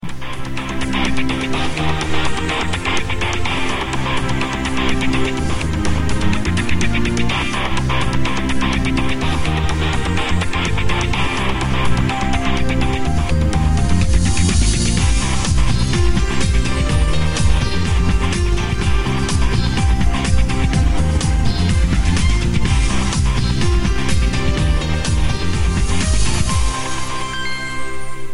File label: Discreet Ringtone